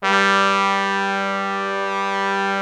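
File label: BRASS G2 left, ORCH BRASS; Greytsounds VOL-1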